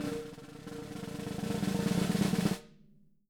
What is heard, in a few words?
SN ROLL, SD ROLLS, Living Drums